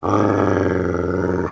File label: growl, Dogz